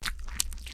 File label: insertion; vore sounds; CHOMPStation2; Compresses and normalizes vore sounds